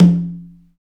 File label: PRC XCONGA, Skin Drums